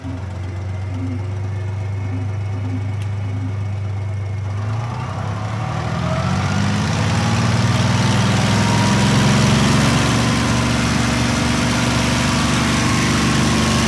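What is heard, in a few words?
Plane P40 Interior Take Off Loop; Airplanes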